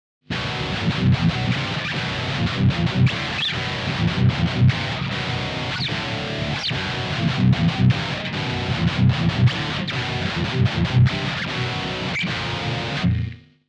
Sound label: ultraheavy